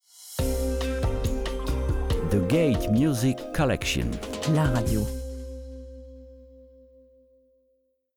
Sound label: GATE COLLECTION MUSIC RADIO